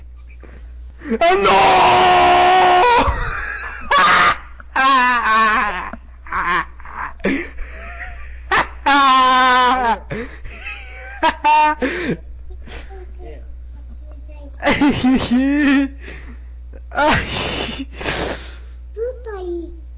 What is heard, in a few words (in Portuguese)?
risada